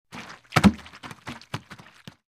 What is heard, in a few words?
Paint Slosh Plastic, bucket impact, plastic